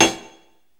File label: plink, Ticks; Misc Percussion